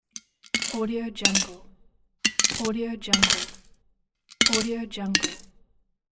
piggy bank coin drop

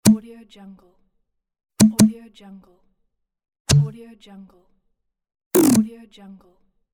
plop pack

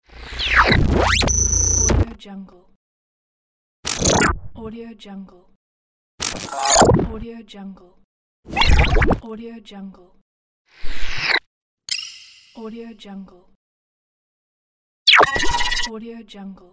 glitch sound effect

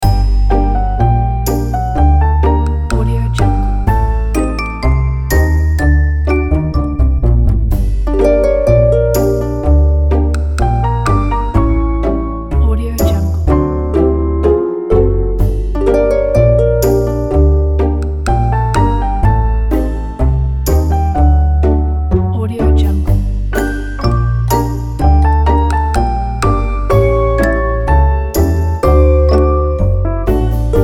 casual background

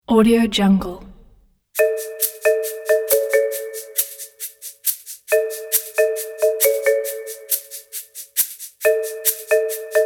sneaking around

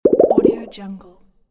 bubbles

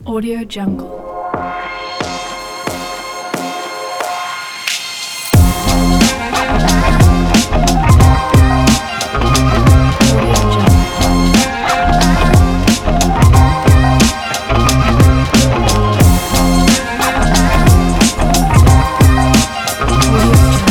hip hop

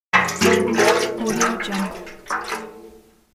gasoline can

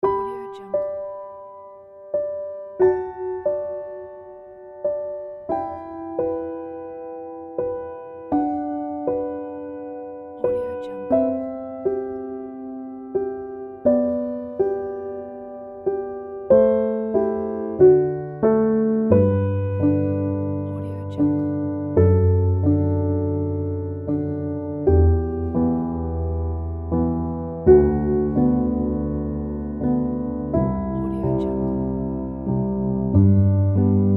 gentle and cute piano

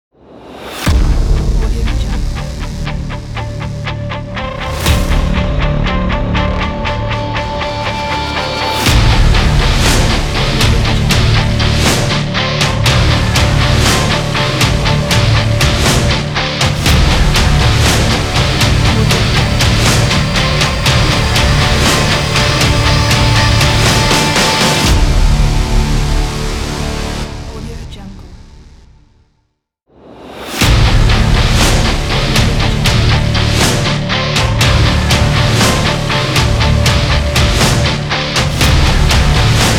cinematic action rock trailer ident

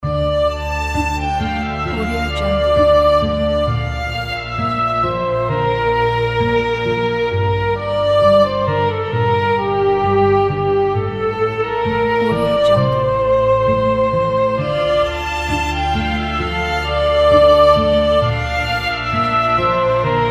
emotional game music loop; pierreberggren

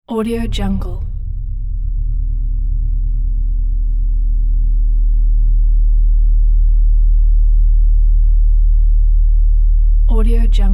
slow oscillating sweeping ambience

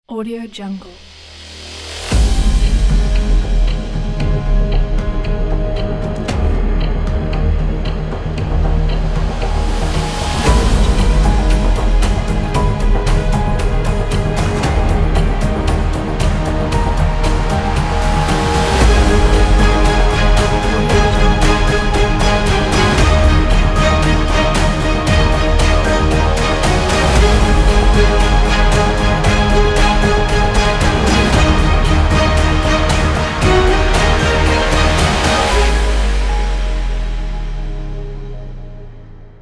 action invasion trailer

